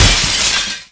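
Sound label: glass break; XBOX; Freestyle Street Soccer; In Game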